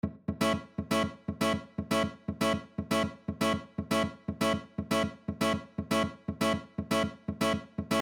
Rhythm, guitar

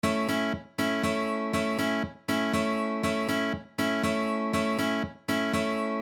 Rhythm, guitar

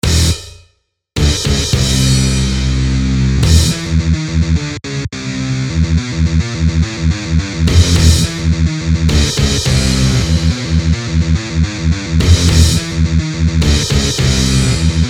Riff, guitar

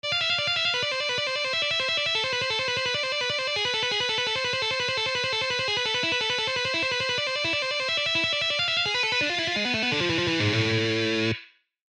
Shredding Exercise

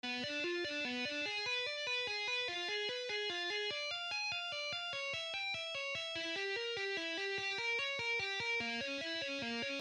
Exercise Slow, guitar; Guitar tapping

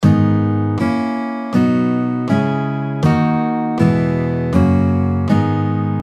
G minor Chord Scale, guitar

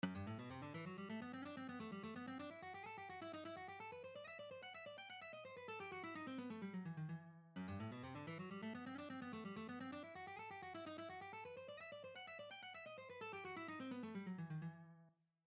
G Major Run

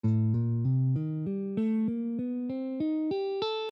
A Pelog

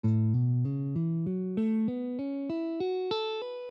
A Mongolian

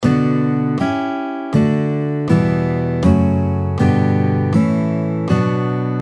B minor Chord Scale

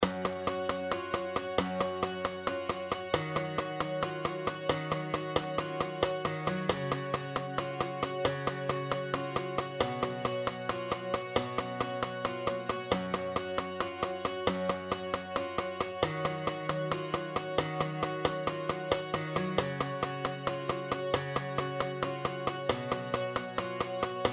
Plucking; odd time signature

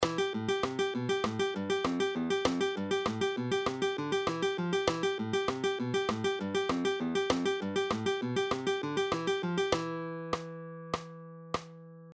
Alternating bass Fingerpicking, guitar; Plucking Exercise 6; fingerstyle; matteo carcassi music

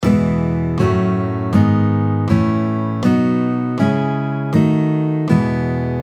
E Major Chord Scale, guitar

